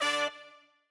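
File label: trumpet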